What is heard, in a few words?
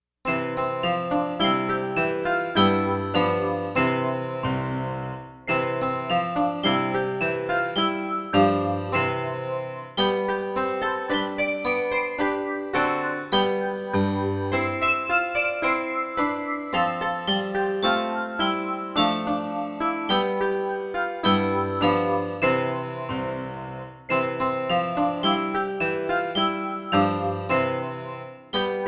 happy song music only